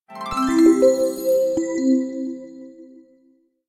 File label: Pack Lock Broken; mahjong royal towers ios macos ps vita ps4 windows gamerip 2013